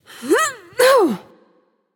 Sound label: grunt jump; smite; voicepack; hera